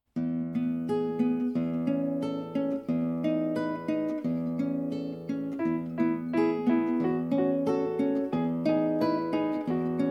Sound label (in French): le gouter intrumental guide chant; Comptines